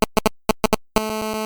cell noise; Devices of Anomaly Redone; catspaw

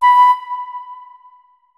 flute notes; Hideout Furniture

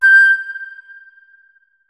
flute notes; Hideout Furniture

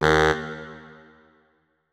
notes, saxophone; Hideout Furniture

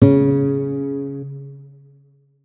notes, guitar; Hideout Furniture

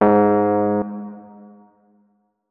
notes, keyboard, electric piano

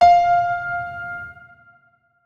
notes, keyboard piano; Hideout Furniture

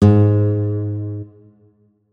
notes, guitar; Hideout Furniture

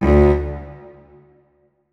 notes, keyboard; Hideout Furniture

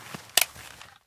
fire mode, weapons; MP5 Reanimation; librarian mplimo

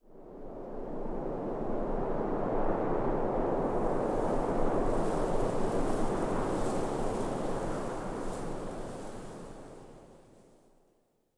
house wind; ambient soundscape; Soundscape Overhaul

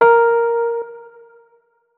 notes, keyboard, electric piano

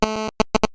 cell noise; Devices of Anomaly Redone; catspaw

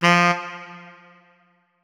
notes, keyboard saxophone; Hideout Furniture

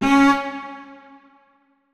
notes, keyboard; Hideout Furniture

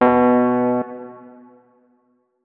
notes, keyboard, electric piano

